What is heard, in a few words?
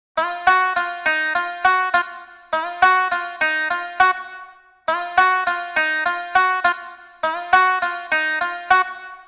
Tzoura; Hip Hop; 102 Fmin